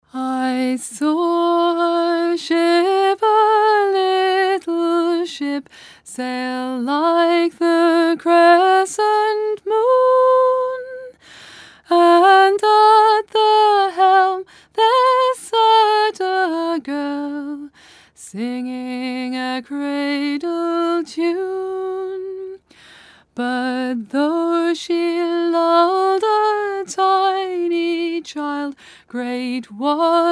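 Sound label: soprano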